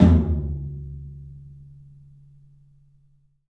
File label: TOM, Low Toms, 3D Percussives